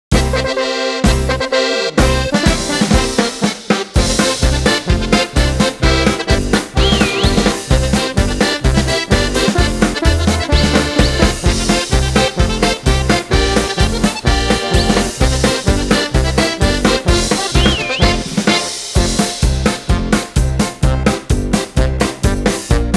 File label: Polka